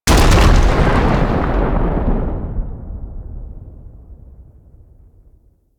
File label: Infested Juggernaut Stomp Ability; Warframe; Attacks